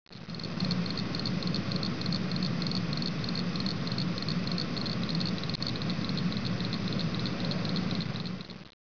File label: northern cricket frog; frogs toads; wildlife